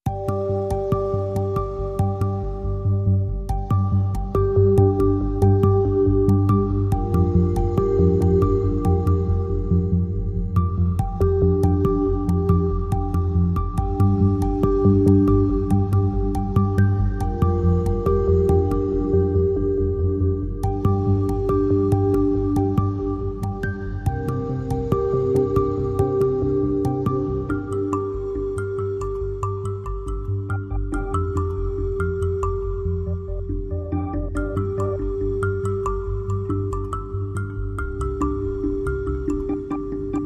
Life Travels No Drums

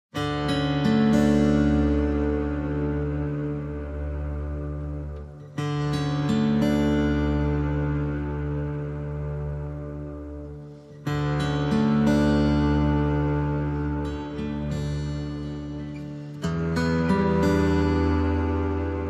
No Piano

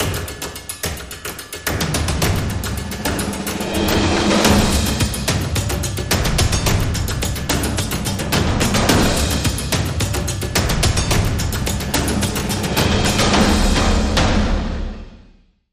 Drums and SFX

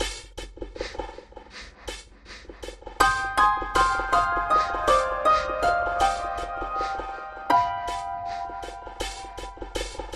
Piano

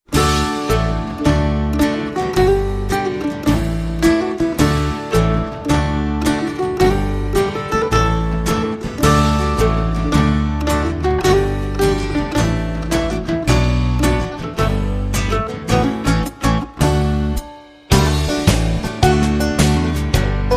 Power Gardening

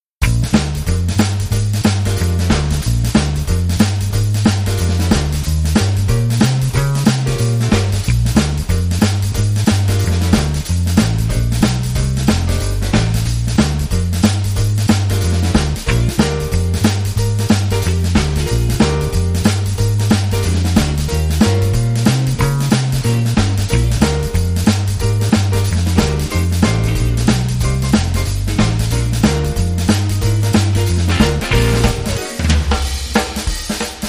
Blues, No Lead

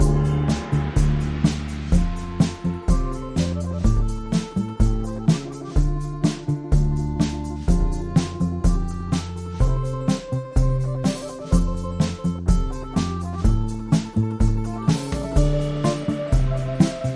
No Flute

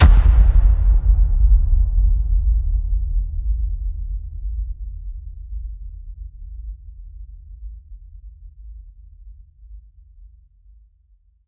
hit low

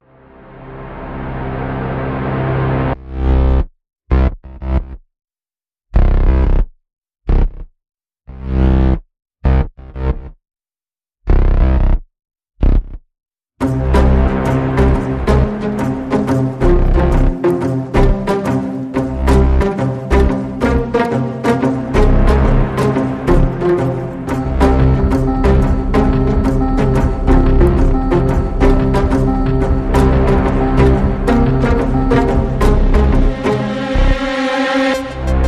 Pizz, No Perc

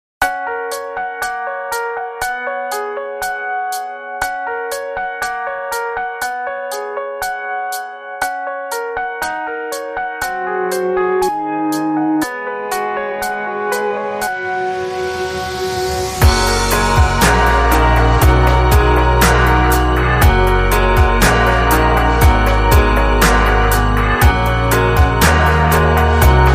Atmospheric Keys

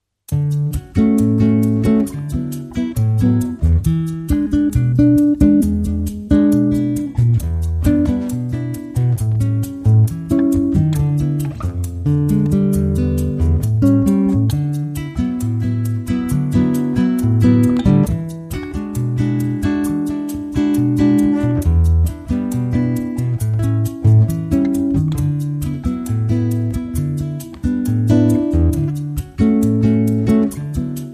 Bossa, No Piano

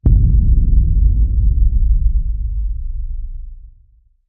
Hit Low

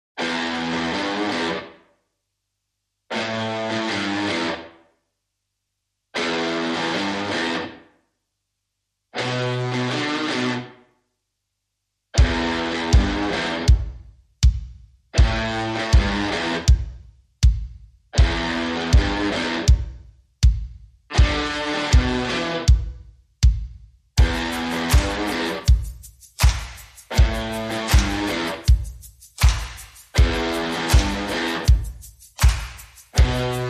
No Choir No Lead Guitar

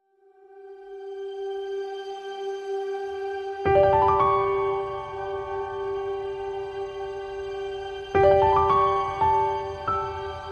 No Solo Strings

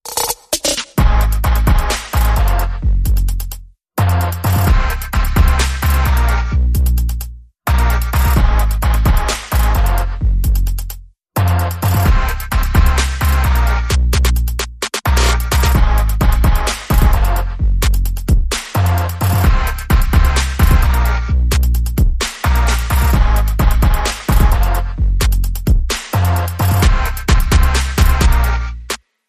Bass and Perc

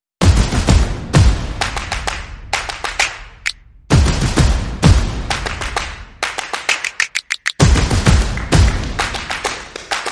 Percussion Bass and Effects

